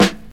00s dry hip hop snare drum sound g key, snare one shot tuned to the G